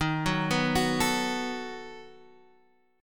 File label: B 7th Eb